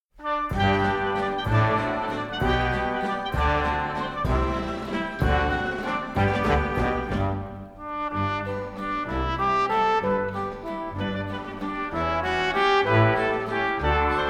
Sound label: Waltz; Agatha